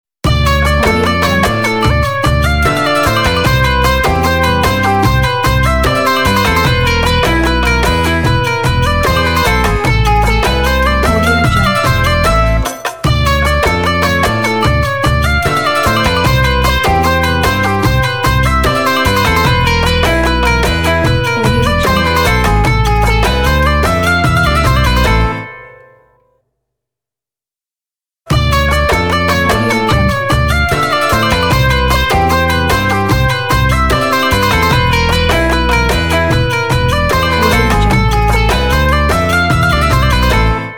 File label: turkish logo